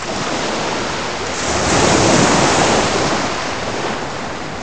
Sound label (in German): hafen